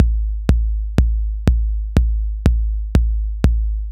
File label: Kicks, 122